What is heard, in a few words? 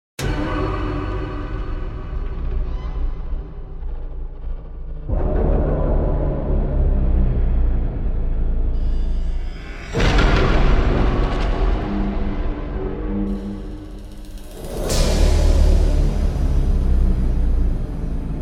trailer music epic dark horror sound design